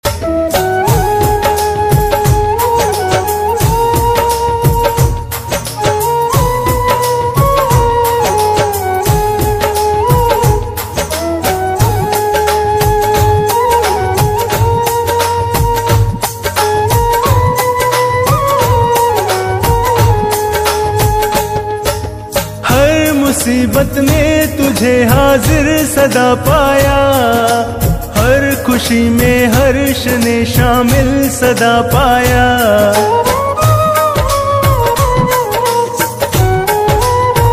Khatu Shyam Bhajan

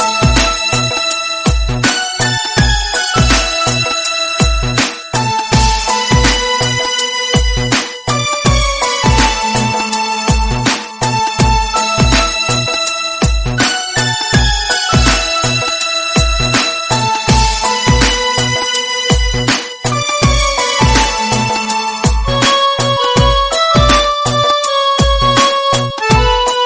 Instrumental Song Ringtones

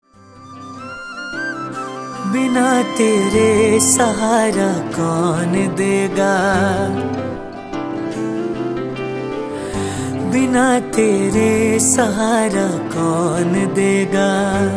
Shyam Bhajan

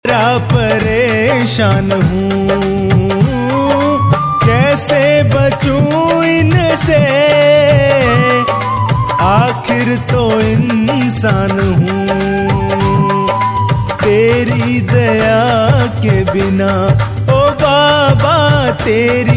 Khatu Shyam Bhajan

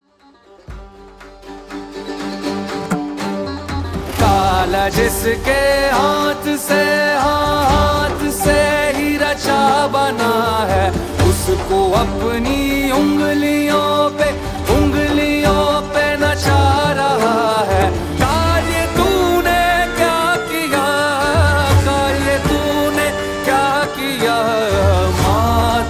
Ram Bhajan

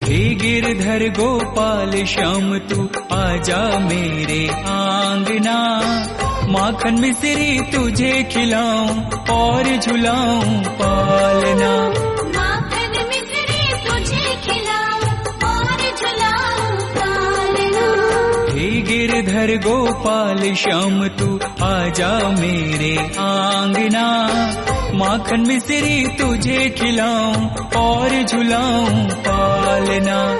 Ringtone

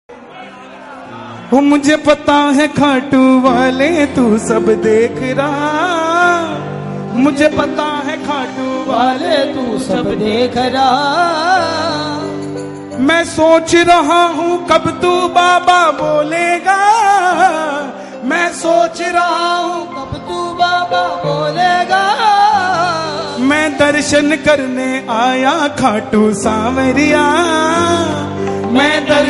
Bhajan

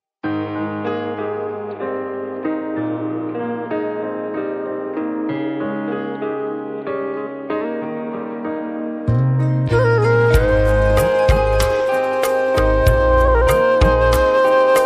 Instumental, Khatu Shyam Bhajan

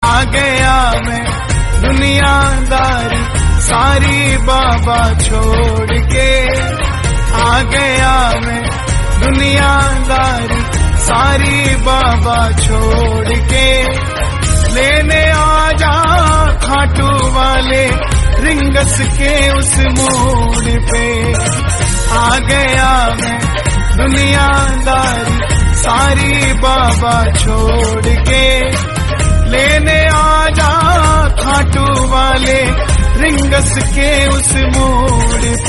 Hindi Ringtone

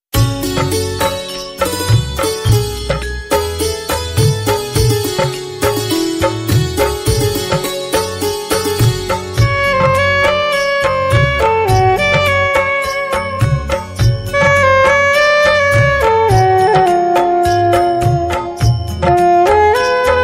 Gyan Ki Devi Instrumental

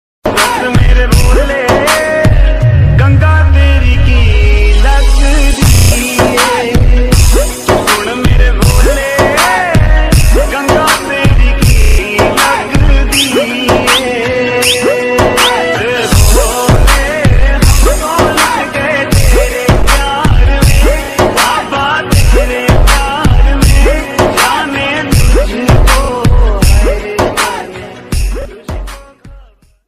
Mahadev ringtone bhakti ringtone Shiv Bhajan

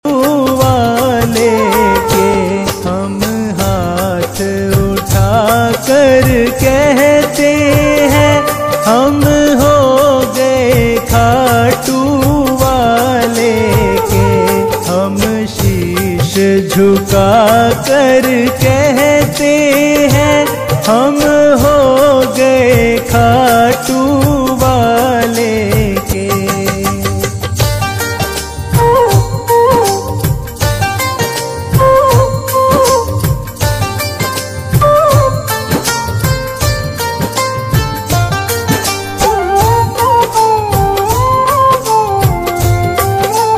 Khatu Shyam Bhajan

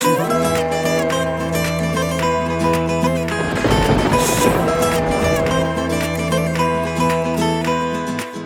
Instrumental Ringtone

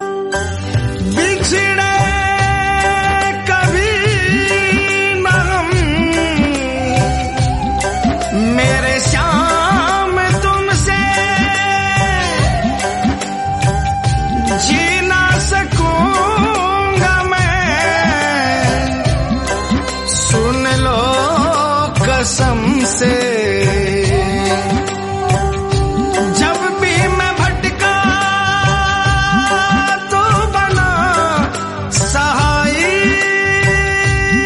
KHATU SHAYAM Bhajan Ringtone

